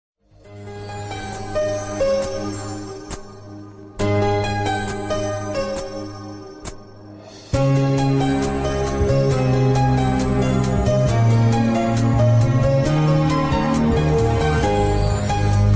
kannada ringtone bgm

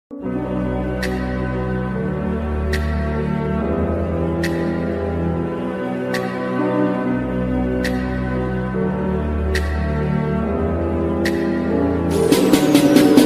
attitude ringtone bgm ringtones english ringtone; bad boy ringtone

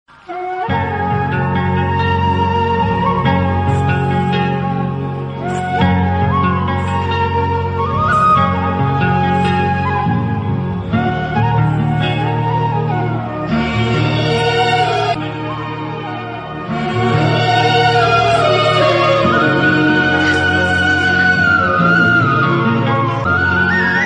emotional bgm ringtone; murari movie hug scene; mahesh babu

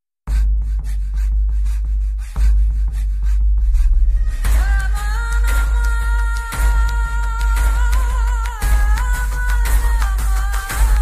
bgm bass boosted; harold das; leo; vijay

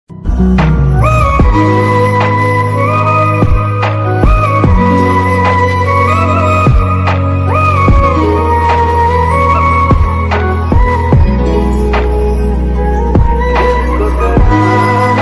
sad alone ringtone, sad emotional ringtone

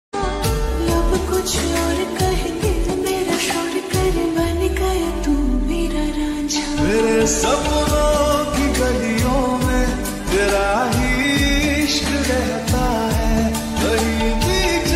lofi song ringtone mobile phone ringtone

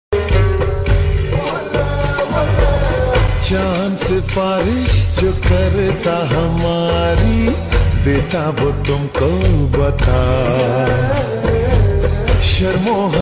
lofi ringtone

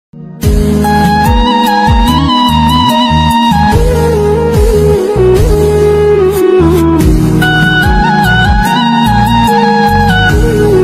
sad ringtone

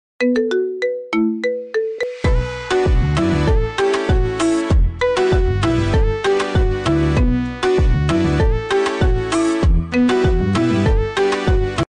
ringtone marimba; kompa; neymar jr

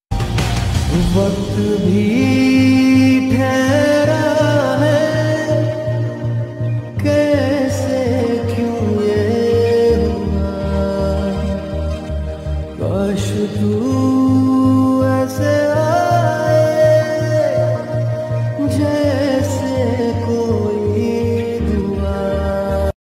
lofi slowed reverb; aashiqui 2

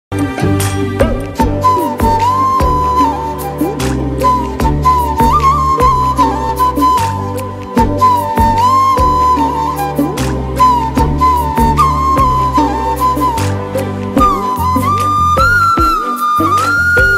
flute music

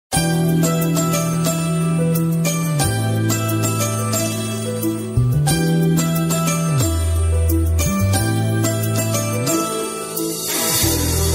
love bgm; athadu

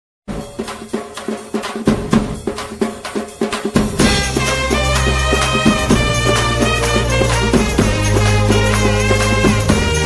friendship bgm

